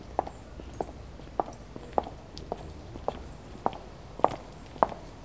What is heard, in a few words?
footsteps on heels on the pavement; mixkit